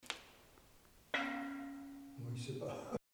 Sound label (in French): cloche; St Dalmas Valdeblore, cloche de 1452